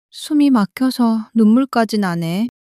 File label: crying